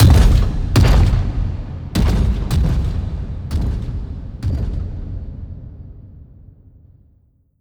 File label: walk off; creatures; strider; seq passby